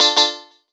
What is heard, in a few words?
E. Gtr Hit; maudio; Hella Bumps